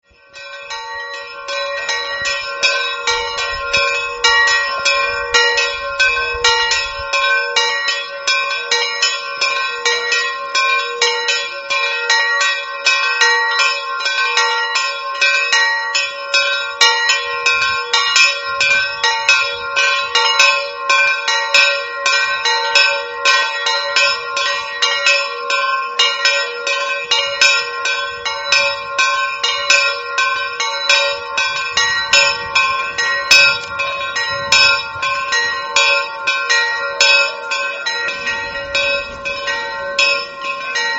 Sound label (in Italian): campane, Pitassi